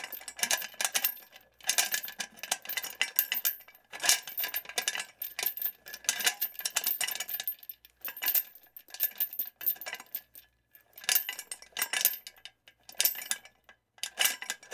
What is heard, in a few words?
Metal Chains Moving Various; Fairlight Sound Lib